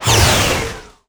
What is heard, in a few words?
Ingame Score Minus